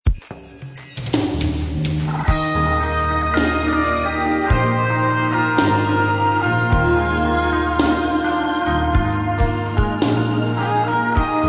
Fly, New Age; New Age Collection Vol.2

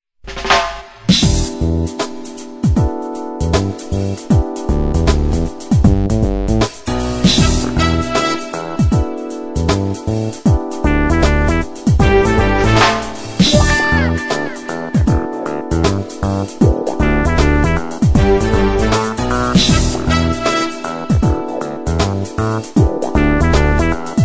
REGGAE; Suite Collection Vol.5

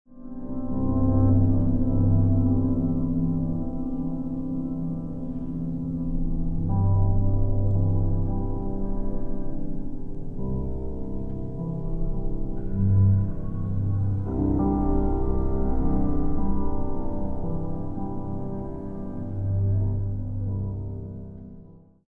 PIANO LULLUBY, New Age; New Age Coll. vol.5

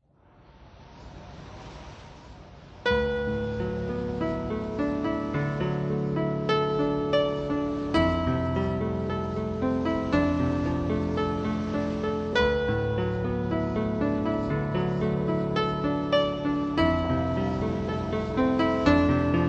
Goodnight Sweet Piano, New Age; New Age Collection Vol.2

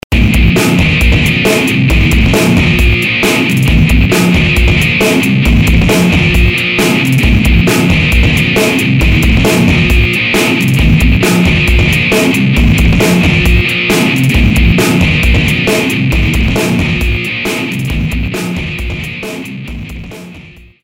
NU METAL & SCRATCH; Suite Coll. Vol.16

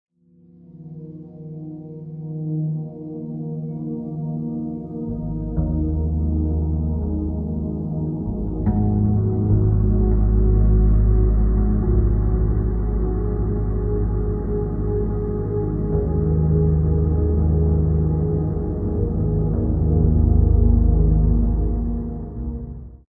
GALAXY ARPS; New Age; New Age Coll. vol.5

